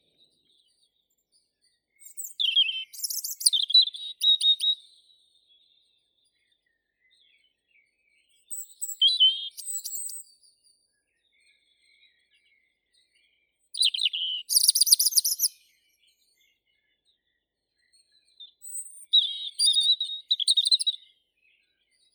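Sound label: robin Erithacus rubecula, nature sounds; malinovka zaryanka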